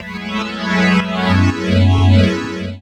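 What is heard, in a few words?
Pad Synth; Equipped Music; Loungin House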